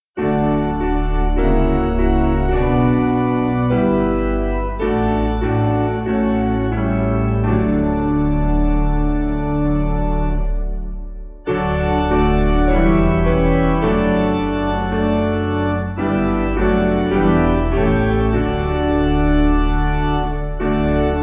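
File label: Organ Piano; Hadley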